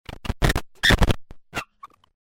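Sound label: electrical interference, connection break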